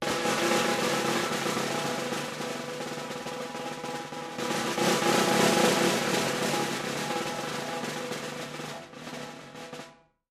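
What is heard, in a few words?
Drums Snare Circus Roll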